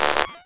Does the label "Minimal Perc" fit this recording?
Yes